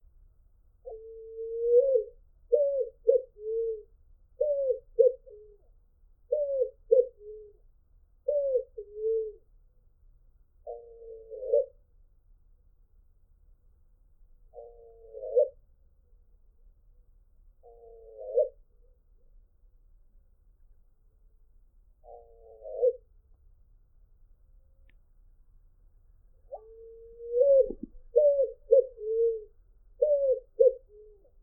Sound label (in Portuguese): Canto da Pomba asa branca